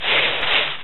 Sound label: tree touch; ambush